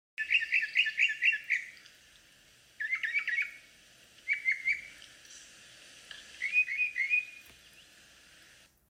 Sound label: northern mockingbird song